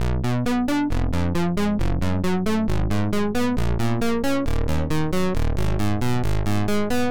Lead; PatchFactory

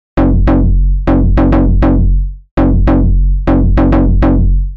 Bass; PatchFactory

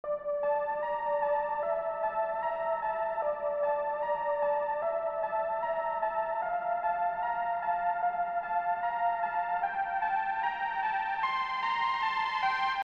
Pluck; Heaven

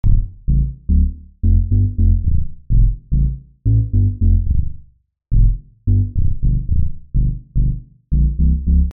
Bass; Zikomo

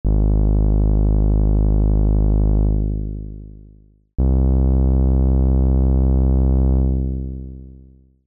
Bass; PatchFactory

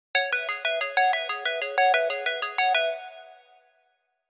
Pluck

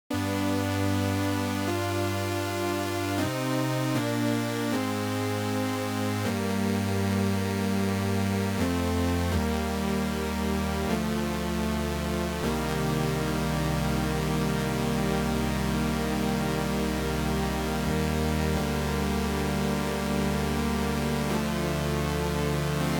Keys; PatchFactory

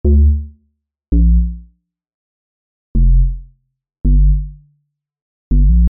Bass; whoevenusesoundcloudanymore

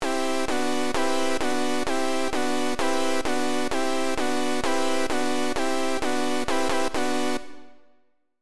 Keys; PatchFactory

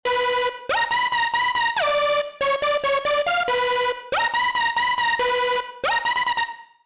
Lead

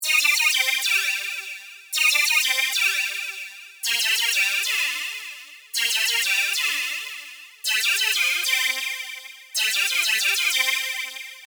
Lead; PatchFactory

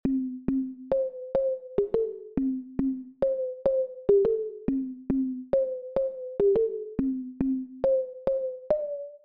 Lead; Alaska

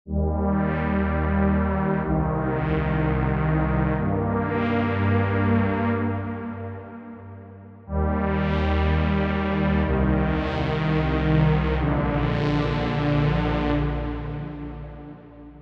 Brass; My Head Is Empty

